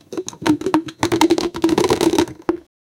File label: plastic bottle floating